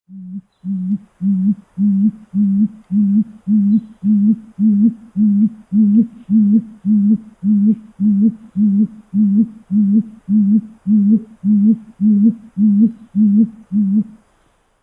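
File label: Great Gray Owl irritation hoots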